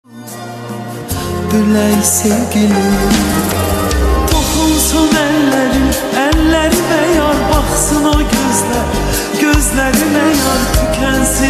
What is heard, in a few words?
Azeri Bass Music Slowed; Tiktokda Trend Olan Mahni Yeni Mahni